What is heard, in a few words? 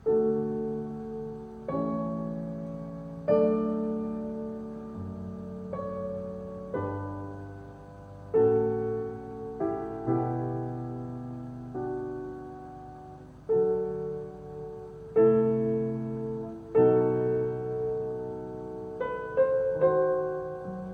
Piano Concerto; Beethoven Piano Concerto No.4 in G major Op.58 mov. II